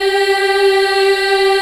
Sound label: G3 child eh, Choir; USB Soundscan vol.28